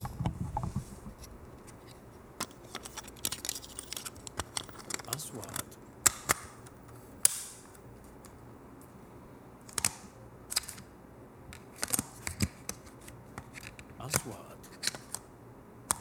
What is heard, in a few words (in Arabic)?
صوت تركيب وتشغيل درون مافيك; shooting